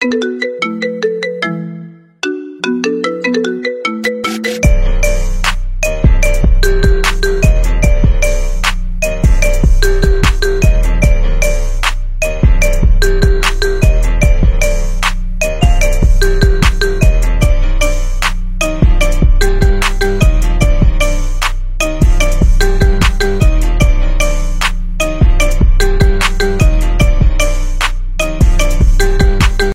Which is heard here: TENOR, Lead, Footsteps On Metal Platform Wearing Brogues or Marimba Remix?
Marimba Remix